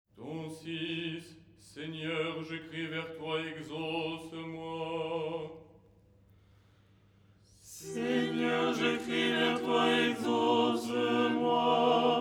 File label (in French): tenor; " Seigneur je crie vers toi… "; Les 8 tons en français; ton 6